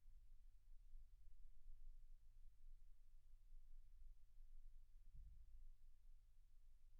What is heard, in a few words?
silence 48kHz